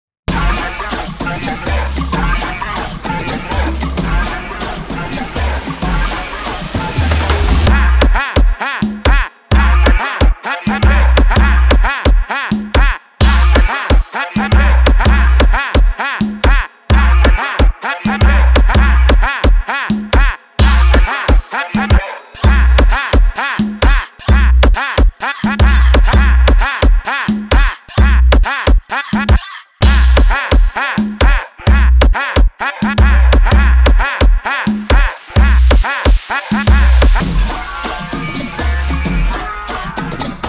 phonk Version

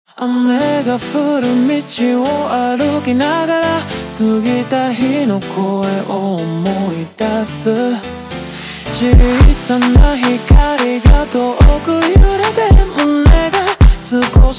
Montagem ultra Slowed

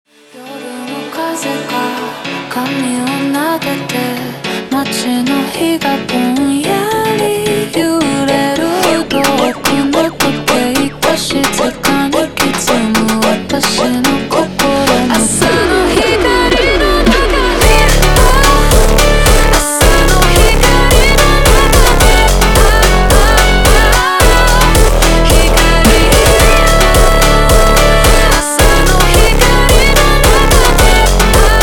Jumpstyle super Slowed

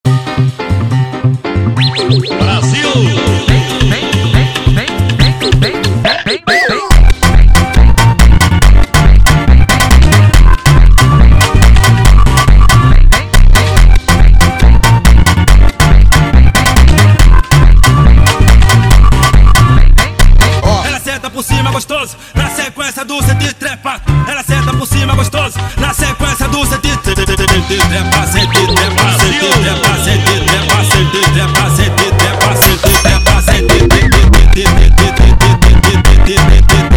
Funk

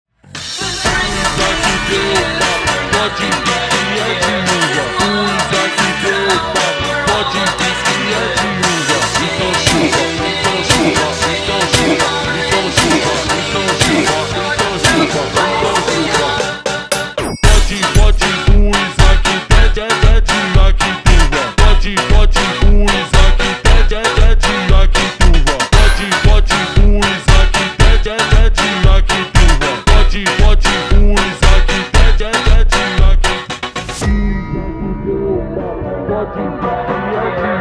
Christmas Kids Funk slowed

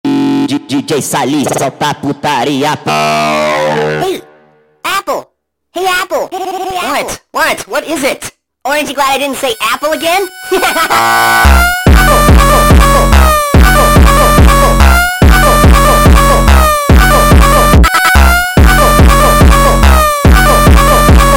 Funk speed Up

